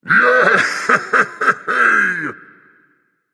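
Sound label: non verbal yay, voice; cernunnos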